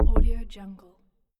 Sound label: the wrong answer